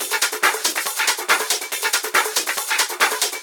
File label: Loop 140 BPM; Vengeance Samples